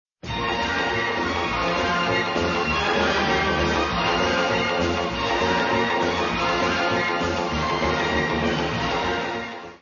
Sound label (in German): nach der explosion; wilder westen heisser orient